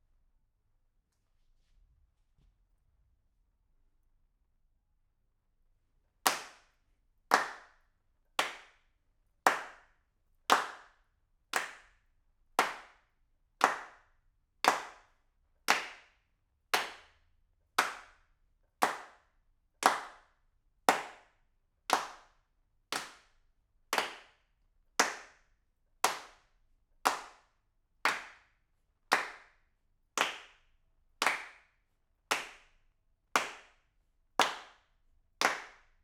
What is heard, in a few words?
ROOM